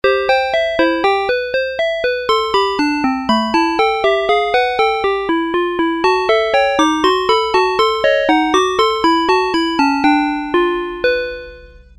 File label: Game Music